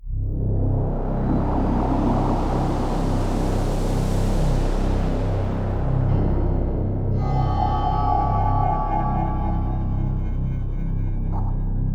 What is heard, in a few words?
The Entrance Ambient; Scourge Outbreak